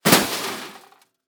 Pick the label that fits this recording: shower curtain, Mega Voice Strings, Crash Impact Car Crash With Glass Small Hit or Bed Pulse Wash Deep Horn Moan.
Crash Impact Car Crash With Glass Small Hit